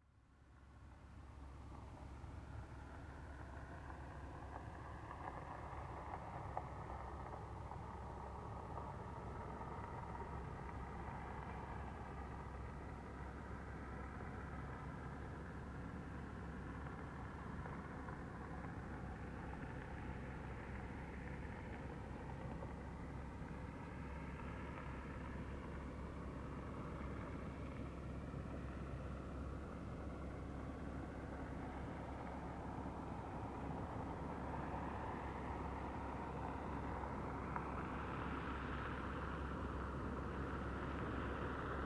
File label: Car approaching and parking